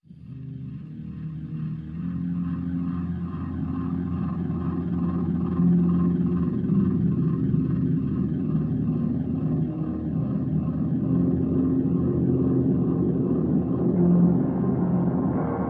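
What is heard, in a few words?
Drone Locust Nest Mid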